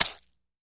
Impact Small Wet Spl, splashes

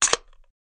Can Soft Drink Open; eating & drinking

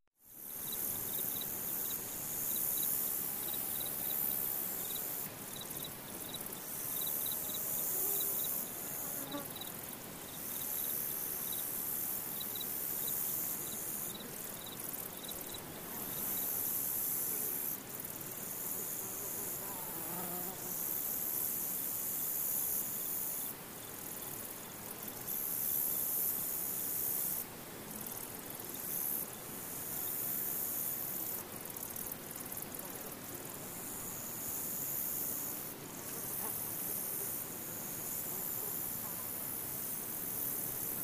Crickets Cicada Flie

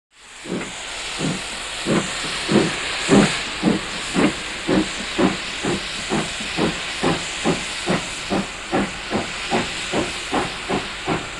Away Steam Train Hiss

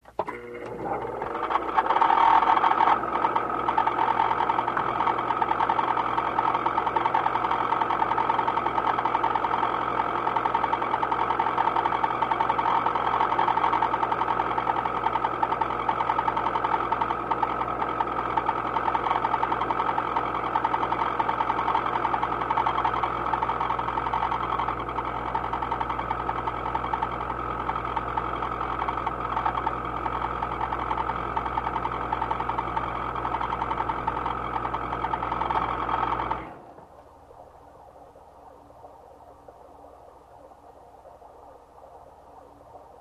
Ambiance Machine

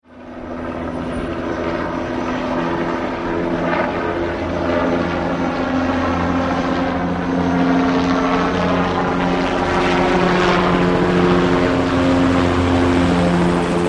Helicopter Fly By; heli-fx

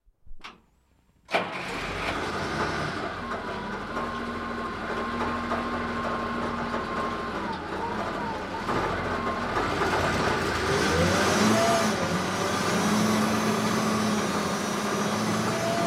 APC Int Startup Drive, armored personnel carrier

